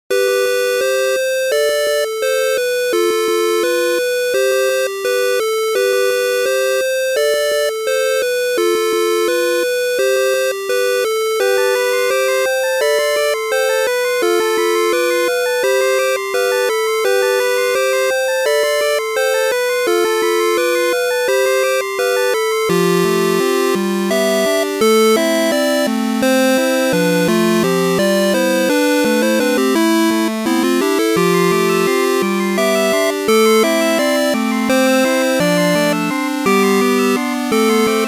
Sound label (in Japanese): 夢オルゴール; ゆめ2っき